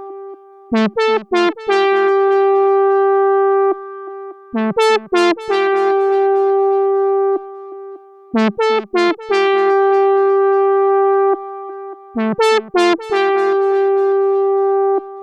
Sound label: 126 Am horny synth, synths and melodic loops